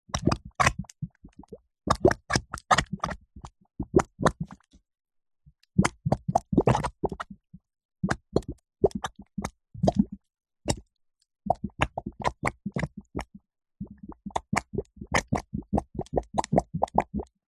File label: mud wet watery or gunge gunk bubbles bubbling long slow spitting